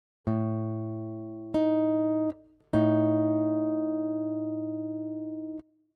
Intervalle Uebermaeßige Undezime

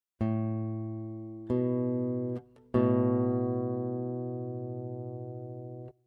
Intervalle Grosse Sekunde

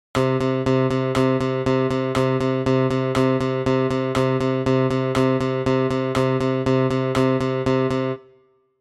Rhythmus Gitarre Sechszehntel

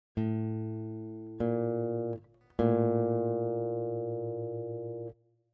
Intervalle Kleine Sekunde

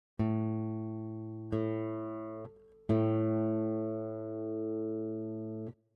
Intervalle Prime

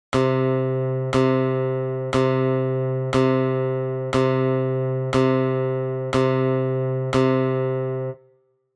Rhythmus Gitarre Viertel

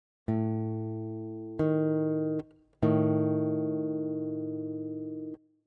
Intervalle Tritonus

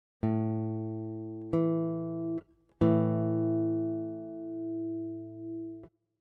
Intervalle Quinte

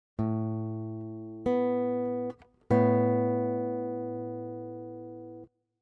Intervalle Grosse None, gitarren

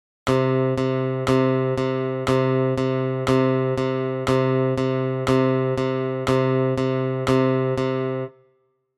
Rhythmus Gitarre Achtel

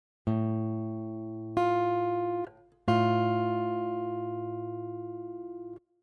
Intervalle Kleine Tredezime

